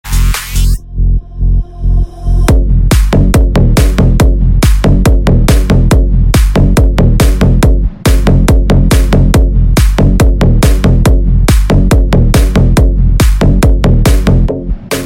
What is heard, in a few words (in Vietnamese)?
nhạc nền remix; tiktok